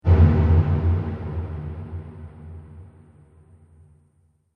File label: Drum Hit Trailer; Hit Sound Effects